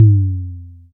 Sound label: large room tom drum sound f sharp key; tom drum samples